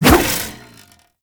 Staff Swing; Warframe; Duviri; Dax; Weapons

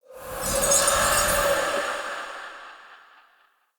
Casting Device Charge Heavy; Weapons; Player; Galene

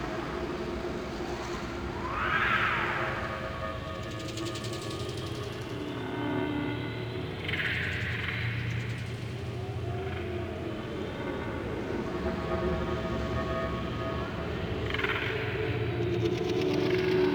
Infested Eris Interior Cave Medium Bed Loop

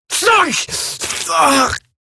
death, female; en; Eximus Grineer; Battle Barks

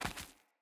Run Stone, Footsteps; Characters; Mother

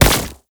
Concrete Archgun Projectile Explode

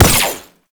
Gunbrella Bullet Deflect; Weapons; Warframe; Tenno; Koumei

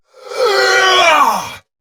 Attack Effort; Warframe; Dax; Dax Ashigaru; Dialog Barks Battle

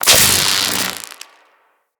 Electricity Hit Effect; Impacts

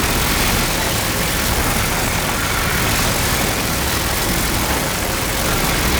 Beam Loop; Warframe; Entrati; Conquest; Vampyric Liminus

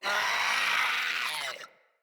Infested Runner Attack

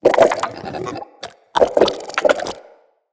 Shrimp Lantern Vocal Idle, Creatures; Baliq

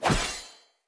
Short Sword Whoosh E; Weapons; Enemy; Swords; SinecureShortSword

